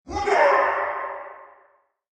Sumo Attack Yell; Yelling

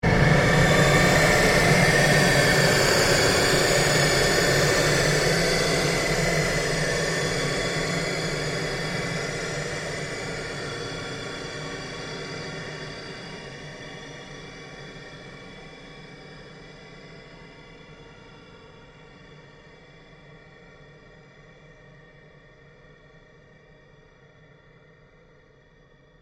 Created Pulse Fade Long; sound building blocks created through manipulation of raw material; Rise And Dive

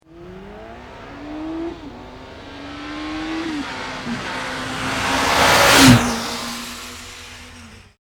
Motorbike Honda 1100 Pass very fast; Motorcycle; Tom Hutchings

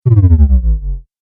Laser Beam Power Down; Laser Sound Sound Effects

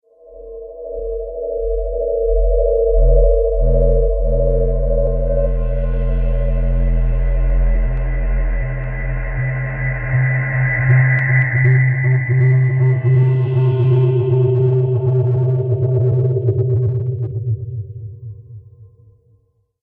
Resonating Metal Horror; Science Fiction Sound Effects

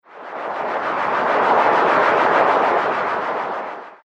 White Noise Loop Ripple

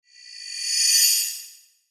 Whoosh Crystal Flange; Blastwave; Whoosh Sound Effects

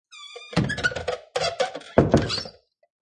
Wooden Door Close With Door Creak; Creaky Door Sound Effects; Lynne Music

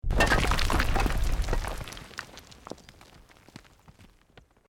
ROCK DIRT SLIDE SMALL; Created dramatic effects of buildings collapsing or breaking; Materials

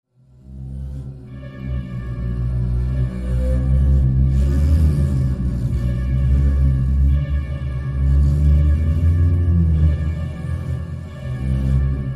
Uneasy Alert Undertow; LYN115 Trailer Production Elements

